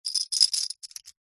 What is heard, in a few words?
leisure game plastic discs stacking in hand; connect 4